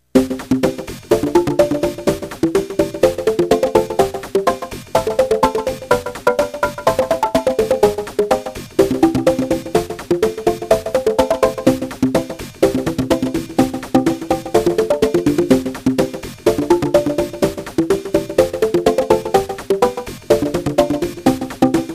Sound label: bongo